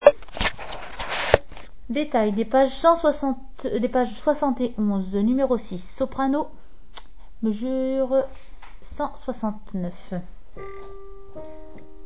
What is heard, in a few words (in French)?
soprano moins une octave; Brahms requiem allemand; mesures 169 à 177 moins une octave